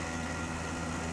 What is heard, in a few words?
radar servo; machines mechanical